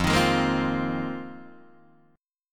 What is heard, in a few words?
F Minor 11th, Guitar DADGAD